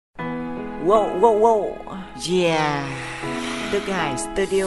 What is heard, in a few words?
rap; viet rap